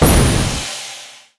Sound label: Main Game attention Wilds Collision Course